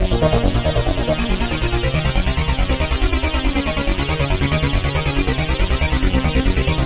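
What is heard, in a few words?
leeda synthline, 140 bpm; SYNTH AND ELECTRONIC LOOPS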